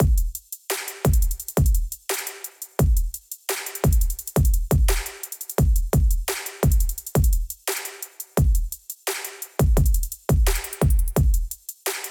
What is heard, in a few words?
drum loop base